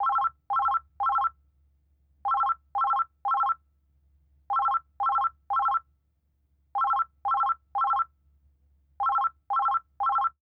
Technology Electronic Phone Office Phone Rings Faster Ring